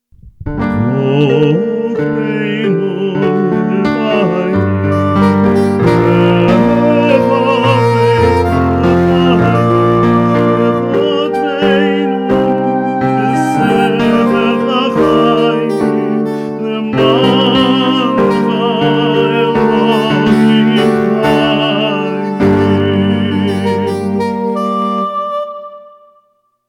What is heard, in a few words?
zochreinu